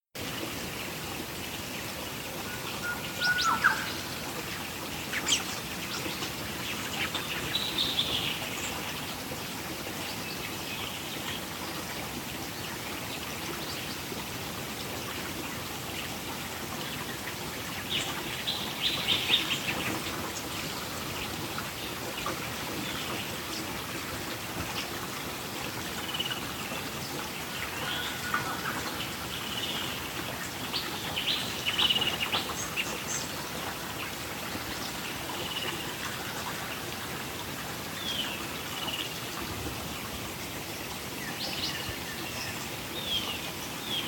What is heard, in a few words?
Rainforest Grey River